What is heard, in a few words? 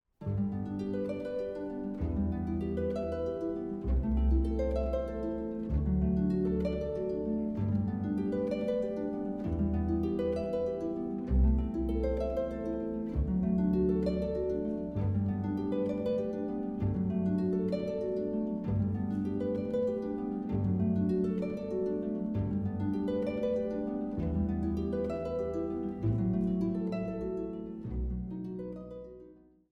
piano